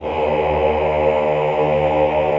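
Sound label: choir E flat 1, Aaahh; Sound & Vision - Gigapack I CD 2 (Roland)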